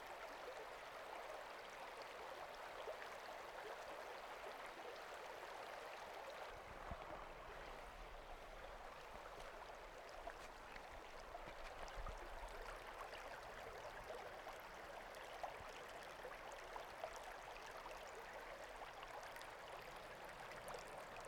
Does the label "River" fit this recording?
Yes